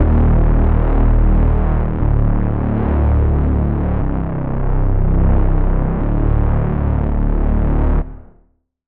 reese, Sylenth1; Reese - Wreckful Gladiator; Routed Sound; Sylenth1 Expansion - Street Vanquisher Vol.1

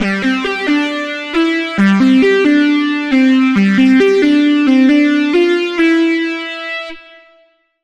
Lead; Routed Sound; Serum 2 Expansion - Reality Maker Vol.1

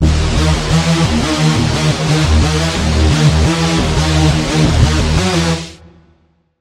Lead, Spire; Dimension Bend; Routed Sound; Spire Expansion; Quantum Enforcer Vol.1

